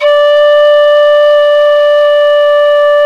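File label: PENNY WHISTLE; Exotic Winds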